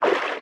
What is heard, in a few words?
creature baby penguin swim slow